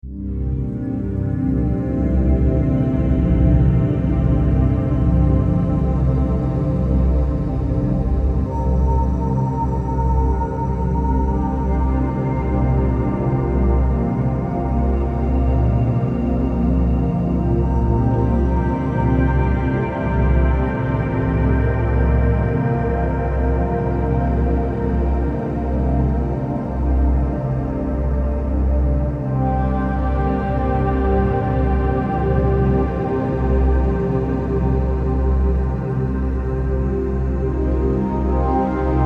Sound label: super quantum theta waves ultra deep meditation 6 hz binaural beats